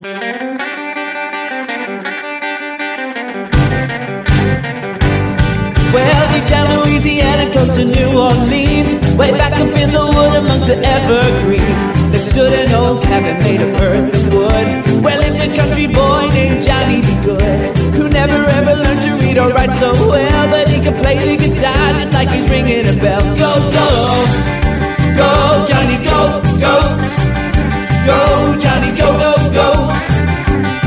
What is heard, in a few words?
Singing